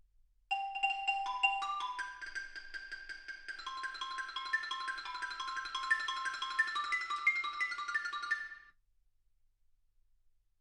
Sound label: xylophone